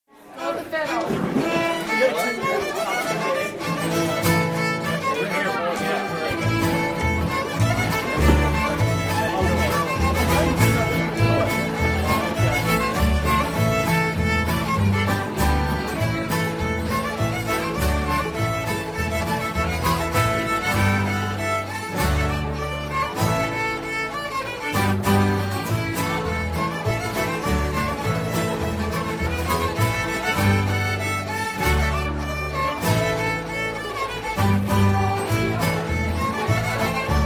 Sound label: fiddle; minstrel; Red Haired Boy